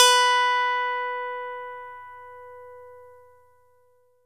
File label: E-GITARRE, Alpine Volksmusik; Best Service